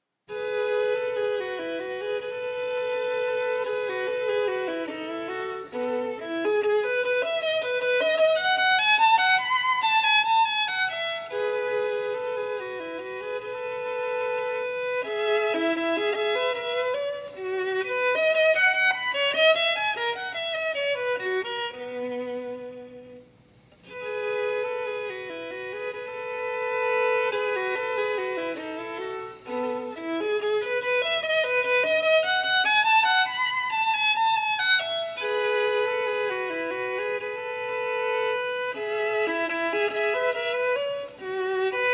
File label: Menuet; Partita No3 in E major